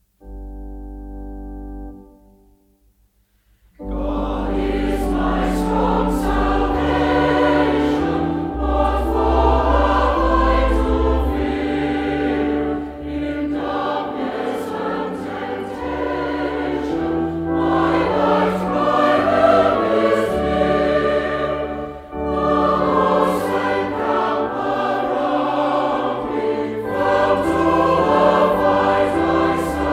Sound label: Choir, hymns